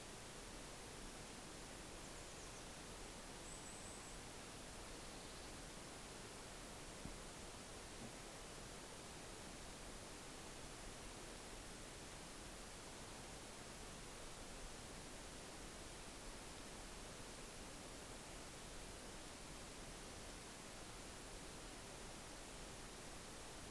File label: autumn forest wind